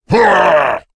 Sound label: Creatures Fight Appear; mighty party 2017 gamerip pc ios android